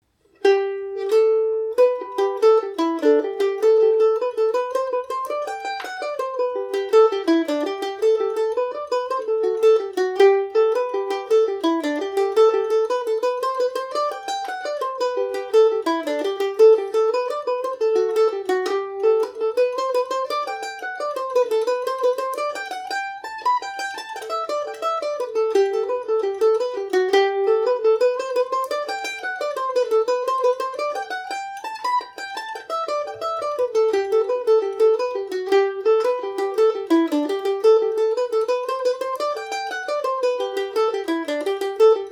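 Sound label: G major, irish mandolin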